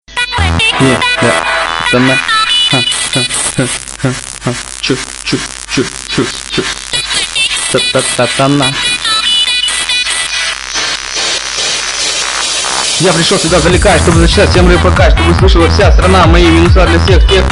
rap

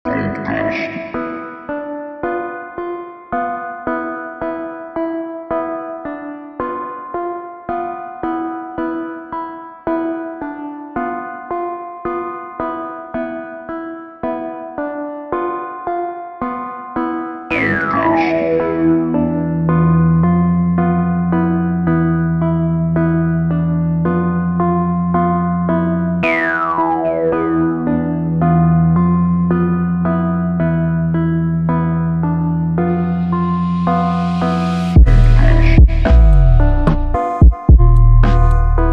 Rap beats

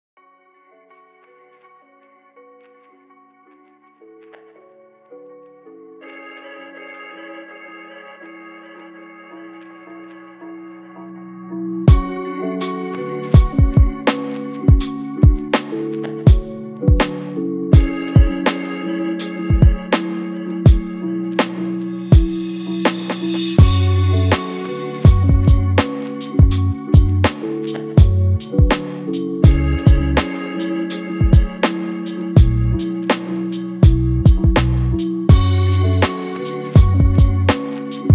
beats

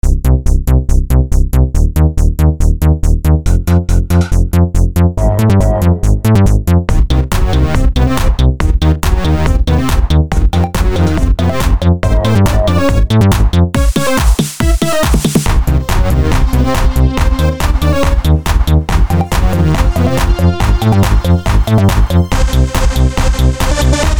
Electronic

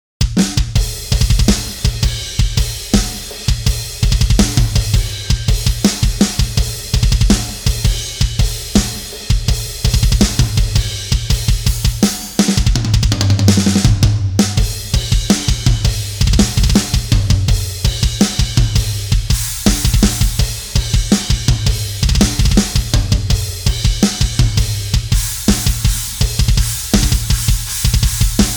Percussion

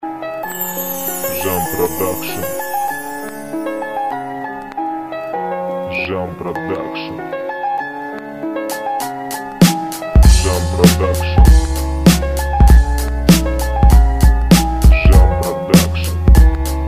instrumental

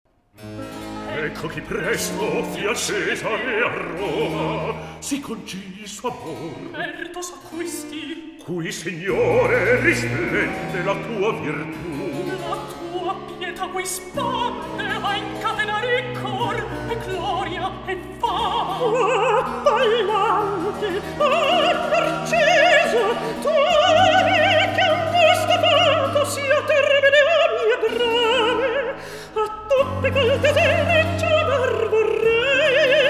Recitativo; Ecco Chi Presto Fia Cesare A Roma; klassicheskoe

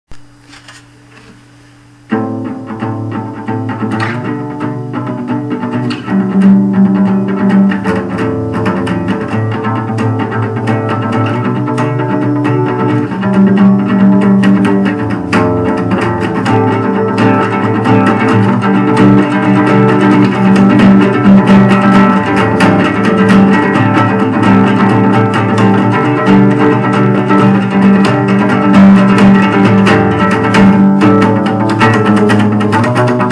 (instrumental)

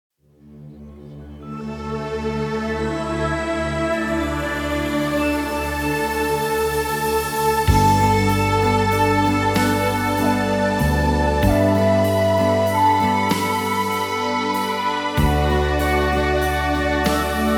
Cinematic music

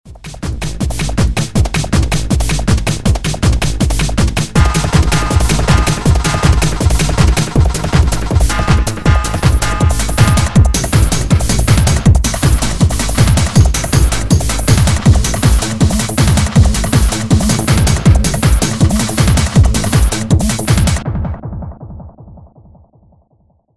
Trance

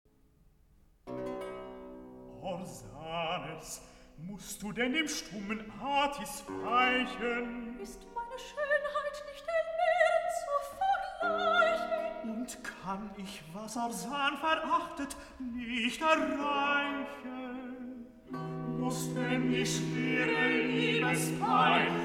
Recitativo; Orsanes, Musst Du Denn Dem Stummen Atis Weichen; klassicheskoe

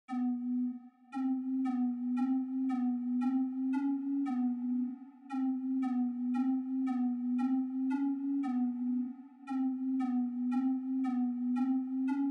Trap

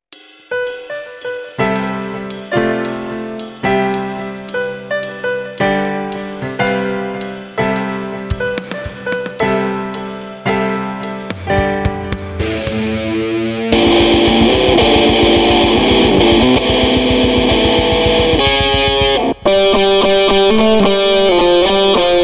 Electroguitar Remix

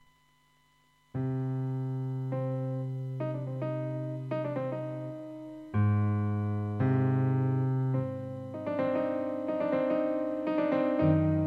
PIANO

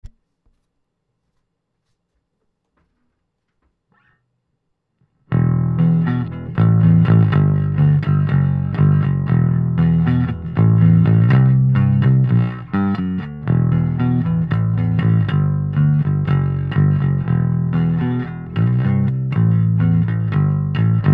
FENDER PB57-65

